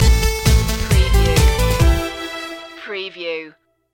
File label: retro video game stinger